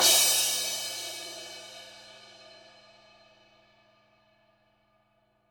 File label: CYM; Bob Clearmountain Drums I